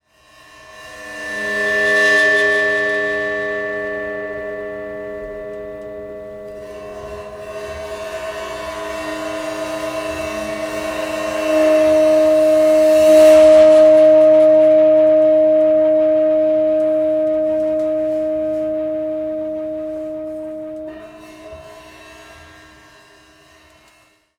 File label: Prepared Drums; Drum Kit Samples; Sonic Foundry (Sony Creative Software); The Electronic Music Manuscript Collection; Battery 3 Sample Kits